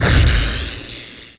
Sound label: ELEC BOLT; wipeout